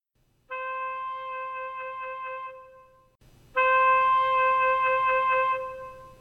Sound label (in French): instrument Do 4 saxo faible puis fort; chapitre 08 Signal sonore frequence niveau sonore